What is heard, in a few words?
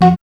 ORG CHD